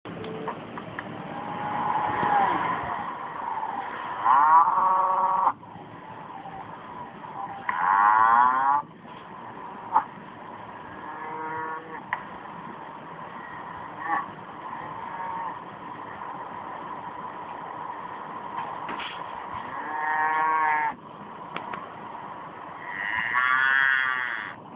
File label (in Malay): bunyi lembu